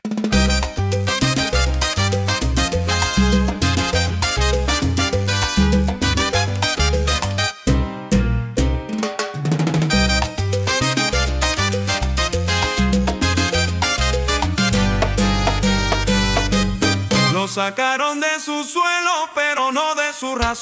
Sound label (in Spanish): Salsa